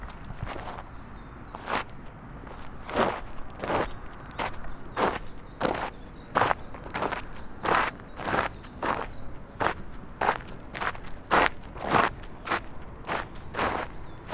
Several Footsteps Through The Forest Near Mount Fuji In Japan